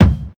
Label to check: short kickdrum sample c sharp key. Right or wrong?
Right